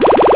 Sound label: block Hit